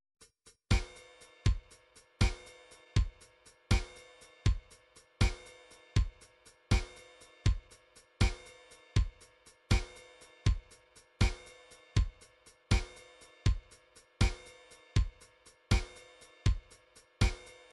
drums, midi drum patterns

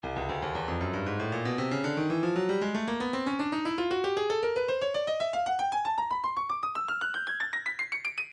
stylus breakbeat midi files 116 bpm; Icee C

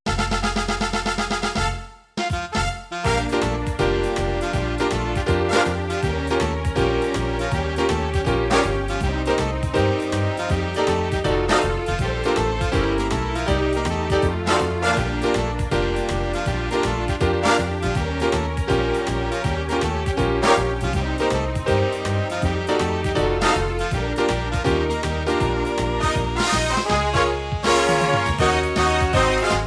jazz, midi

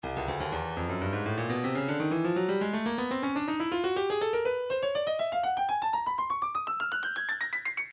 breakbeat midi, 122 bpm, motown jam; Stylus Breakbeat Midi Files

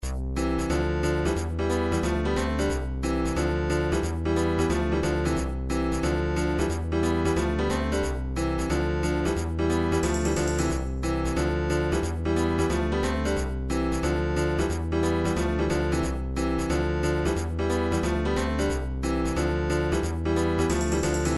character select; game system super nintendo; sequenced; super mario kart; paper luigi

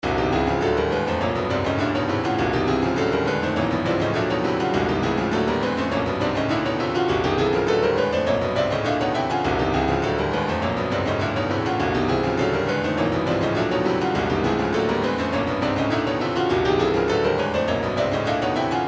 retro funk 102; chop wood

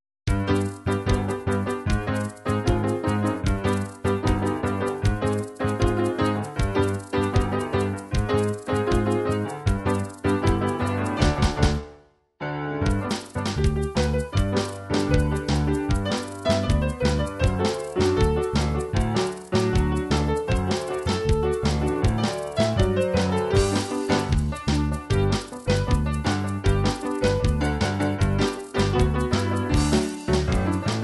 salsa, midi

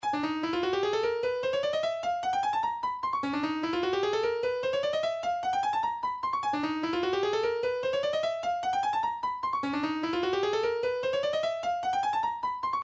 room, midi

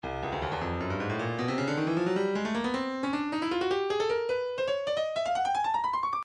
breakbeat midi files 140 170 bpm 155; Stylus; spasm

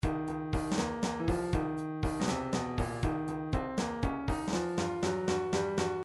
midi drums, blues

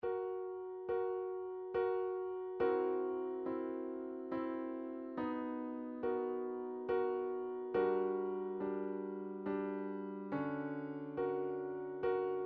piano, midi

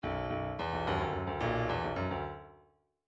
midi grooves funk

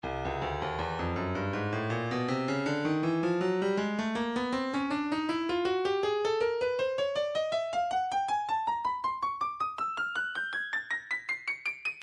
percussion midi files shakers 080 shaker; Stylus

